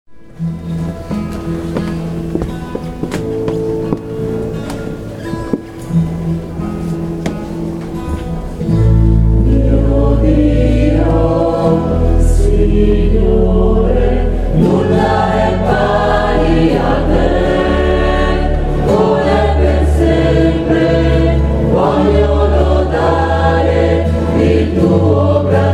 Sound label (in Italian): coro Giovani, Popoli tutti; Paderno